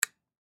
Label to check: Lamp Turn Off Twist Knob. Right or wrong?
Right